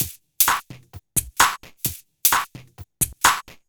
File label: Electro House, 130 BPM, Percussion; Sonic Foundry (Sony Creative Software); Underground UK House & Electro